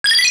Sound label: void pistol beep